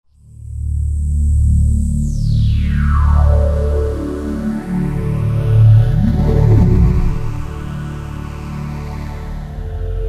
Roland JV-2080

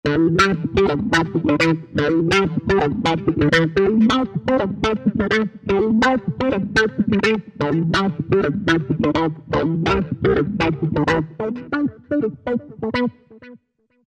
V-Wah, Roland V-Synth; Factory Patches

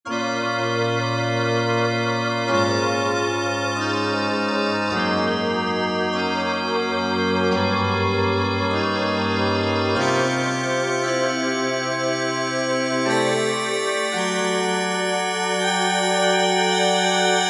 Glass Pad; Korg MSC-1S Variety

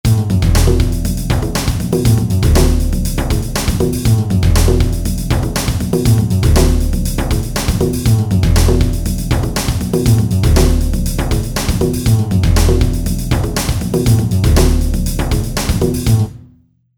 Hard Rock

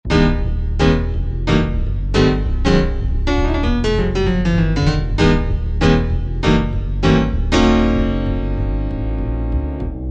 Bass & Piano; Korg TR-Rack

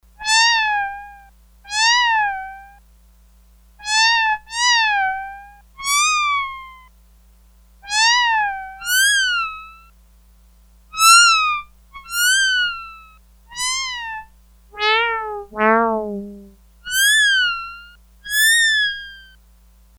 Meow, Sequential Circuits Six-Trak; Factory Programs

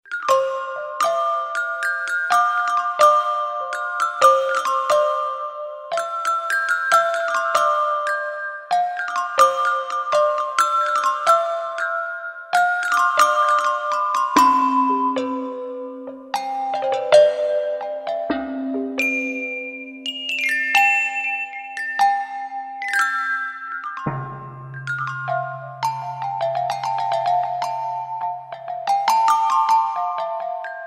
Temple Bells

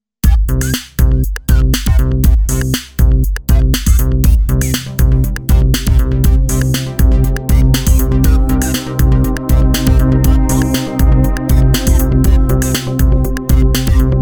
Electro Express

